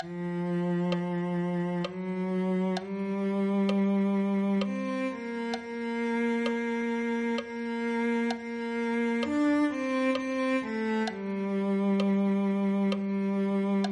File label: cello